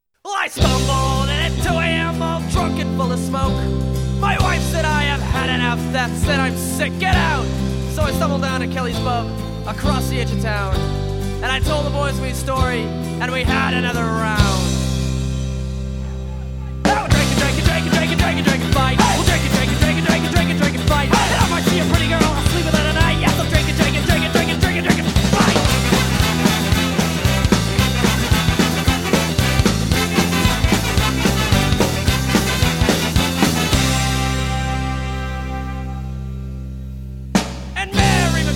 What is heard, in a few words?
Irish Drinking Song